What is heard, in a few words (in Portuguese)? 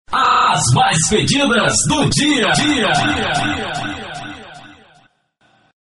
vinheta